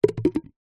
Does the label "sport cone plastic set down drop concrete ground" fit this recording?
Yes